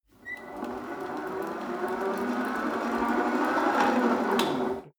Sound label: rope trainer pull sequence slow, fitnessstudio